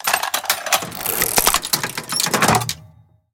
prodolzhitelnij perebor metallicheskih prisposoblenij

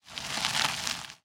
korotkoe shurshashee peresivanie melkih kamnej

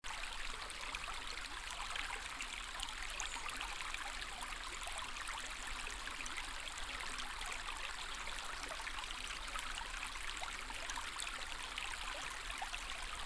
uste reki voda techet v reke

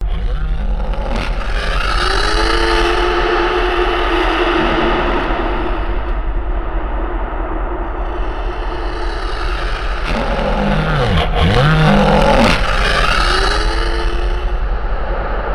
inoplanetnoe sozdanie monstr chudovishe

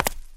shag po kafelnomu polu na kabluke

